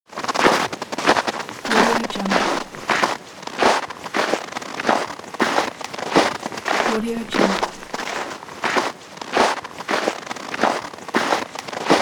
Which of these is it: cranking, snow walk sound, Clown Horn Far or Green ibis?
snow walk sound